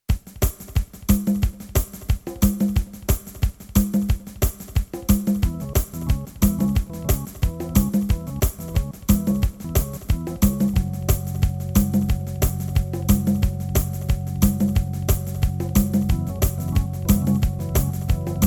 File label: Prelude; hyper terrestrial; Gather